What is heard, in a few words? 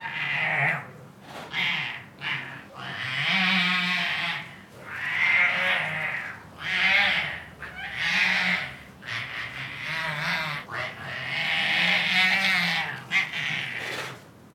Siberian Tigers Make Aggressive Meows, Snarls and Snorts; Sound Ideas